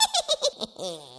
Totem Dmg

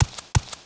Run

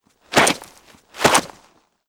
Cyber Horse Idle

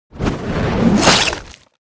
attack; Seer; GamigoNA

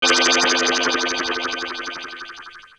closed space; Seer; GamigoNA

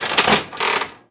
Spin

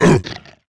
Goblin Captain Dmg; Seer; GamigoNA

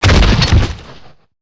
Lizard Man Die

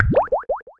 Slime Die

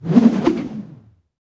Flying Staff die

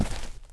walk; Raplan; Seer; GamigoNA

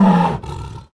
Were Boar Dmg

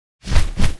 Garuda Run; Seer; GamigoNA

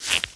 Sheep; Seer; GamigoNA

